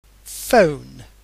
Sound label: Phone